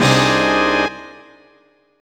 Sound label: Hit; Banjo Kazooie